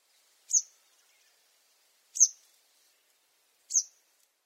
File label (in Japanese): kitatsumenaga; koe; sakata